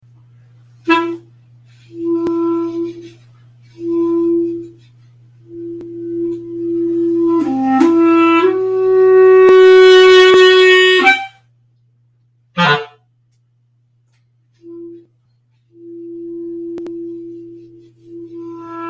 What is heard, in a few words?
Dialogue, clarinet